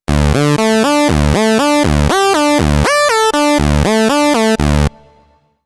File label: vco pwm